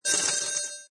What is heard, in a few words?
Digital Sweeper Warble Swell, Science Fiction Sound Effects; Scifi Production Elements, Set 2; Lynne Music